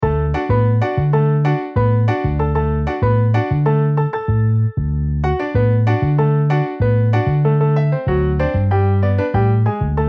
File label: Piano Bass